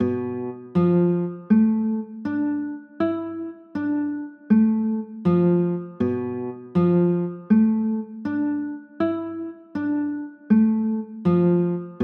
g 13, chords

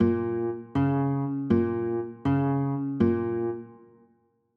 b flat m, chords

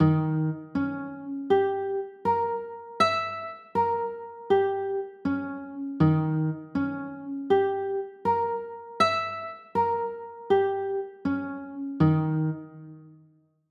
d sharp 7 b9, chords

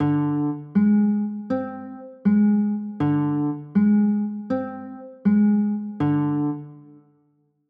d 7 sharp 9, chords; d 7 sharp 11

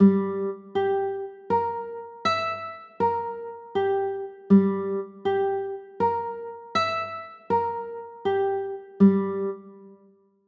g m6, chords

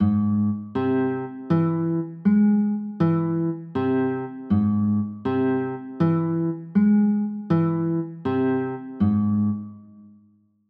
c aug, chords

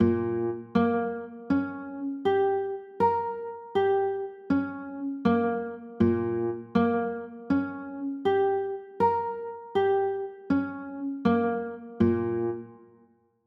b flat m, chords; b flat m6

